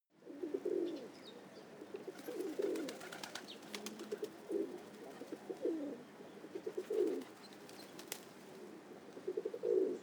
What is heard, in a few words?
pigeon coo